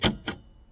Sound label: keypress, Typewriter Kit